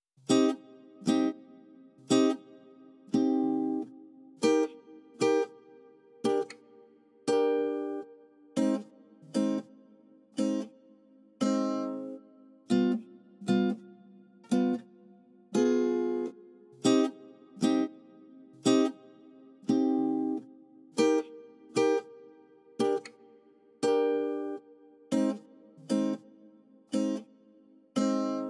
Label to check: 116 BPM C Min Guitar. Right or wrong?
Right